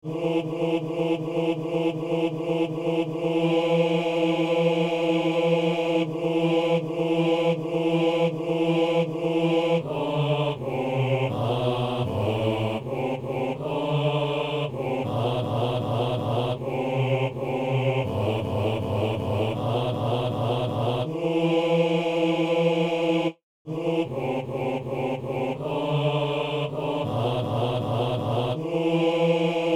basse

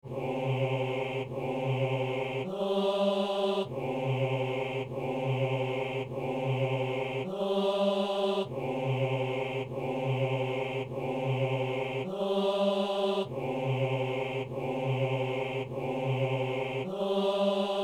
basse; Notre Père

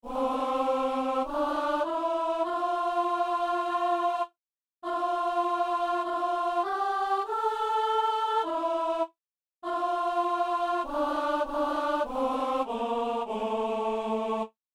dieu est amour, quatre voix, alto

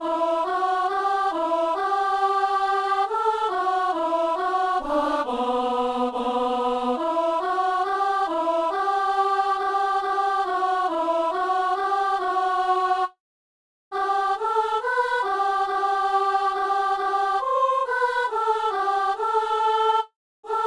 soprano; Messe du 17 janvier à Saint-Denis

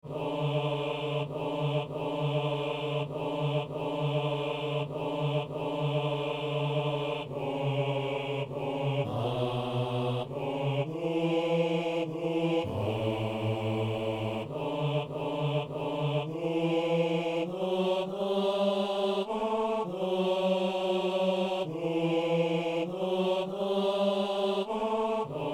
basse; Messe de Saint-Boniface - Anamnèse

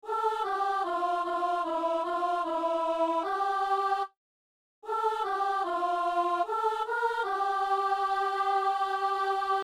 psaume, alto; Psaume 125 (126); Messe du 17 janvier à Saint-Denis

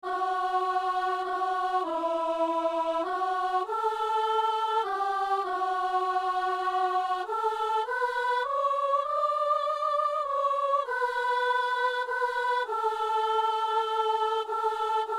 anamnèse, soprano; Messe de Saint-Boniface

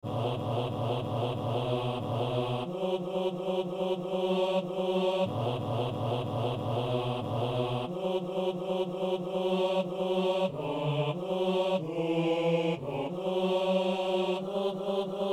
basse; Saint-Denis

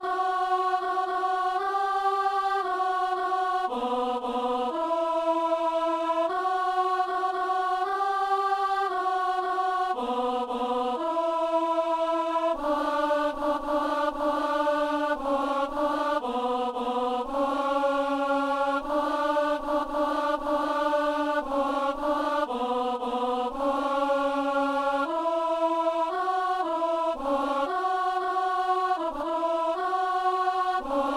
en la majeur alto; Gloria Patri (Palestrina)

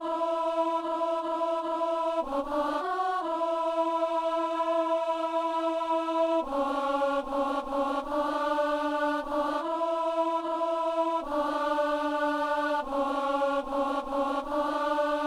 soliste

